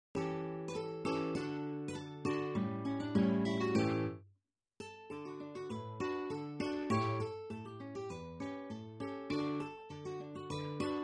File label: polka brillante; ferrari